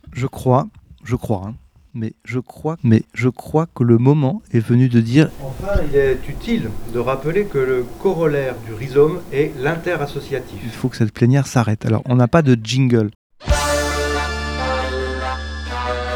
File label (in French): JINGLE FIN DE PLENIERE; réseau des CREFADS; Lathus St Rémy (86)